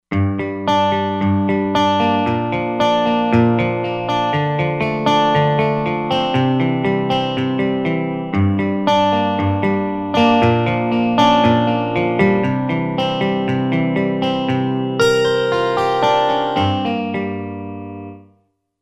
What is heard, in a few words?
Double Track, Roland Fantom XR